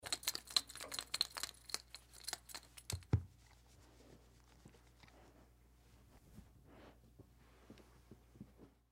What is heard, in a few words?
Dog Pet Scratch, Dog Pet Scratch Collar Tags Jingle Pat Side; Animal; Dog Sound Effects